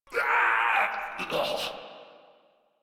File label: Death, Grineer Male; Warframe